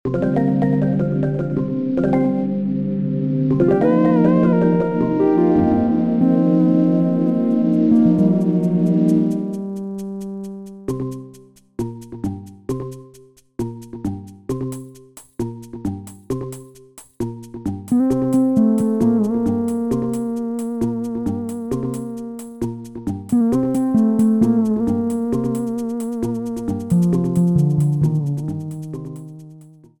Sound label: Cave Dungeon music; SM64 DS